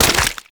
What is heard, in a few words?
Run Sludge; Footsteps; Warframe; Tenno